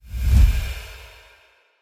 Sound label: free Spin Reel Spin; jewel fruits